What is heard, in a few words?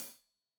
Hat Loose, Hi-Hat, Cymbals Stereo